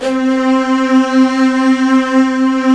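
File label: orchestra strings C3; GRANDSTRG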